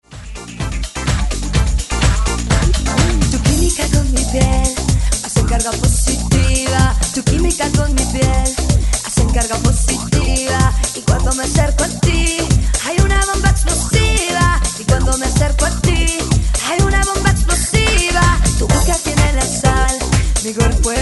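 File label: Latin extended 126 Bpm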